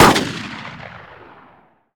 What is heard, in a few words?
Weapon Sniper Rifle-Fire